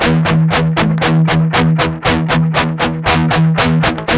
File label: 8TH F 2, Guitars; Spectrasonics; Hans Zimmer Guitars VOL-1; 128-JACKHAMR